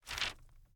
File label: click drawing